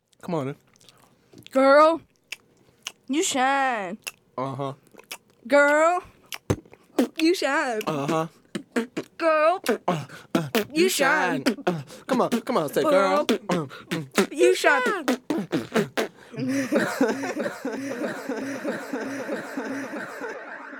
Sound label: Interlude Beat Box